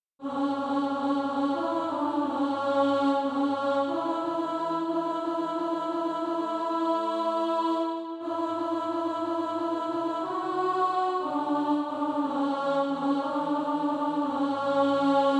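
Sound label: alto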